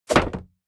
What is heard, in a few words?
object grab wood